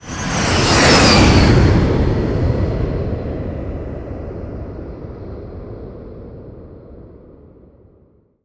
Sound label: Vehicles Jet Flyby